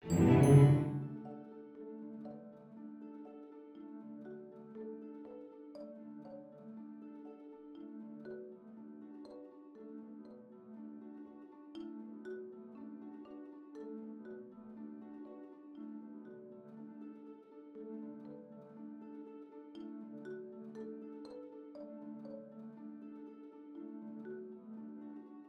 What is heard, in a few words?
Menu Music; Tetris